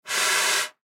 Trumpet Spit Valve, Trumpet Spit Valve Blow; Brass Sound Effects

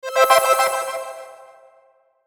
Sweeper Pulse Synth; Sweeper Pulse Synth High Fast; Production Element Imaging Element Accent Transition; Sweeper Sound Effects

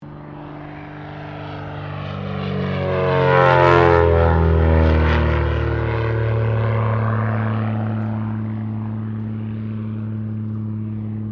AIRCRAFT CESSNA O-1 BIRDDOG PASS

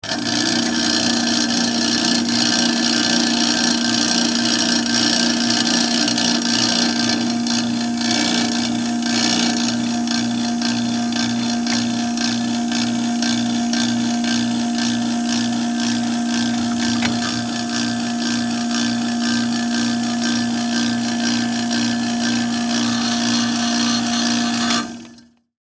Diesel Gas Pump, Operate; Machinery Sound Effects; BlastWave

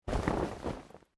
Clothing Shirt Cotton Move; Movemement sounds for various articles of clothing; Dressing; Human

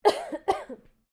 FEMALE COUGH; Female coughing and hiccups; Clearing Throat (Sound Effects:Human)

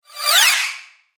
Cinematic Whoosh Flyby

